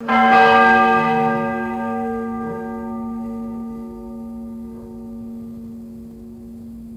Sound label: large clock tower bell chiming twice; clocks